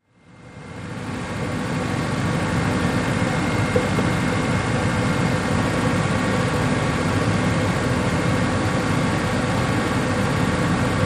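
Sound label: Small Car At 25 35 Mph, compact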